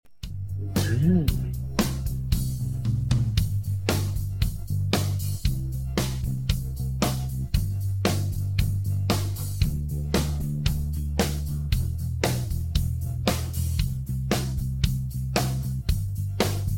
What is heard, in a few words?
pop country; backing tracks; pop country solo 2